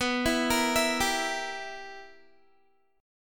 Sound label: B Major 7th Suspended 4th Sharp 5th B down Guitar; BM7sus4#5; arpeggiated; 4E,4A,5D,5G,5C,6F